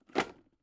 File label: plastic bowl small close rattle